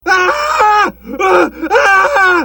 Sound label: ahh; hl2dm